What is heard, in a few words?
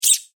Mouse Squeak Rodent; Miscellaneous Animal Sound Effects